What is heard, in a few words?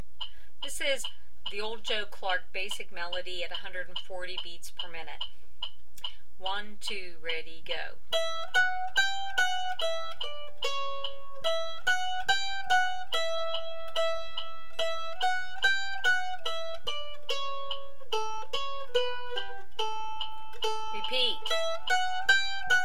Basic 140bpm, mandolin